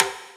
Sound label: Percussions